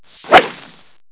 golf swing

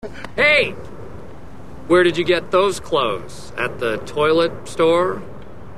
toilet; Anchorman

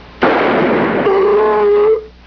dead horse; Animal House; "Ooooooruuuuh!"

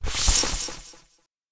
Paper slide echo

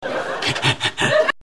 Laugh; TBBT; Sheldon; Big Bang Theory

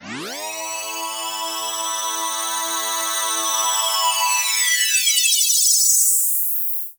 Flanging synth

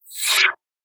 Electric wash

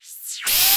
Spinner

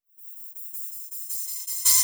L to R pulser in

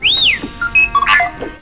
beep whist; star wars

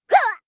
Fairy scrap

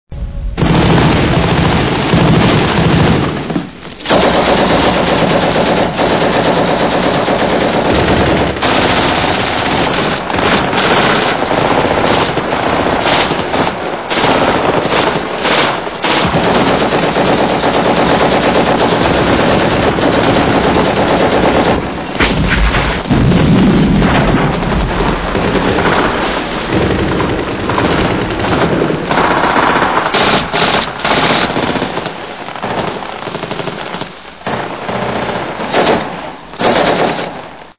war gunfire

